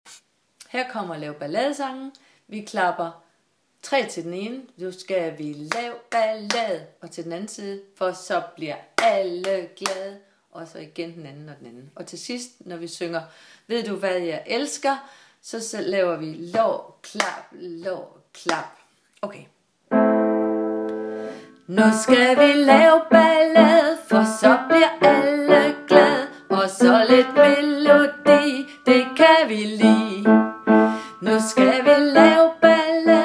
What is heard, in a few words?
ballad